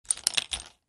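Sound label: sounds of playing checkers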